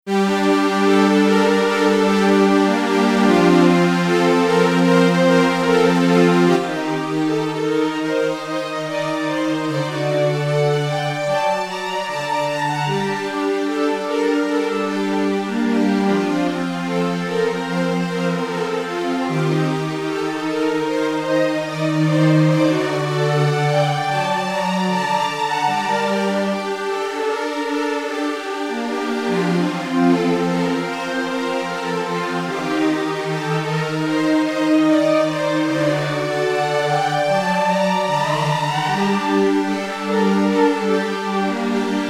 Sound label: Strings Chorus FX und Feedback; iSEM